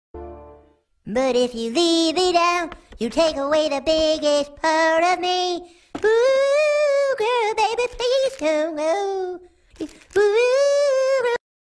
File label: Singing; Butters